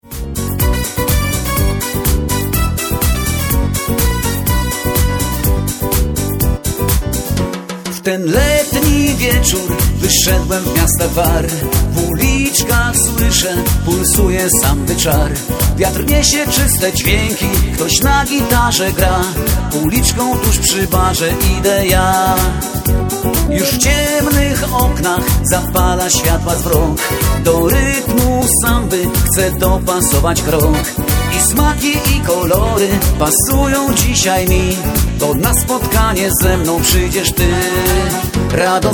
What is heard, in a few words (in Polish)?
samba